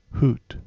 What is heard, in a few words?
hoot